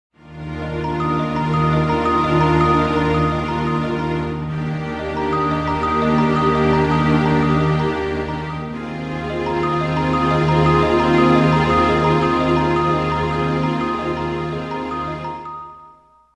Music logo - Contemplative bell pattern, sust string chords; Hollywood Edge Sound Designers Tool Kit